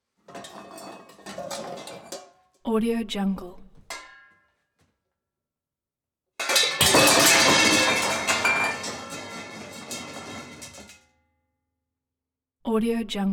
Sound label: pot rack falls; collanderson